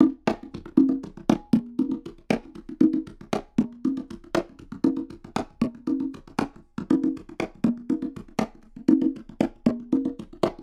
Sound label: Congas Binaural